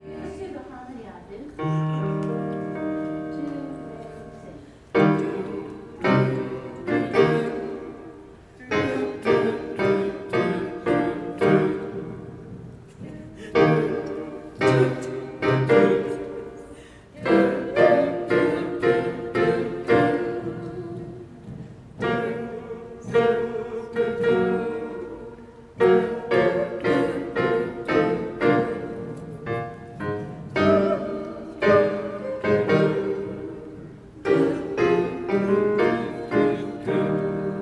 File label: harmony on doot; Gather Hymnal